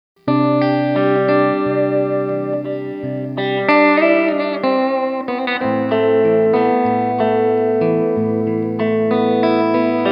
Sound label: gretsch clean